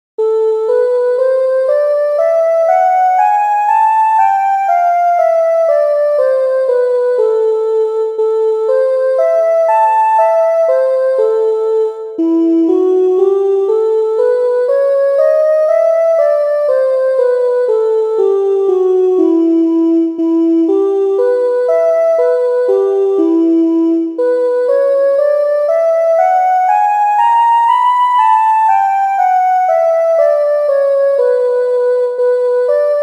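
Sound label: Melodic Minor exercise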